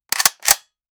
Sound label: firearms